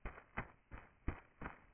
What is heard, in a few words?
Deep footsteps